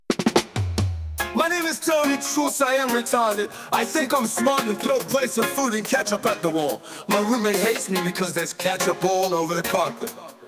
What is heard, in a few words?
reggae